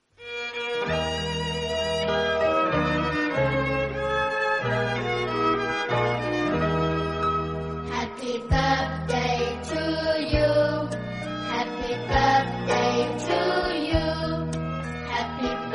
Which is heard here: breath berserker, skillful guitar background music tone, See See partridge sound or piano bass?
skillful guitar background music tone